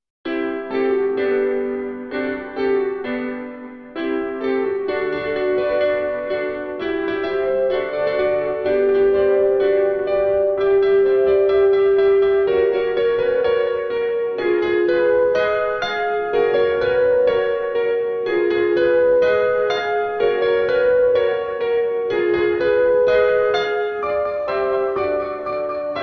classical music pop and cute bright atmosphere fun lively